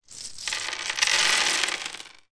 game plastic houses and hotels pour out of cloth pouch on table; monopoly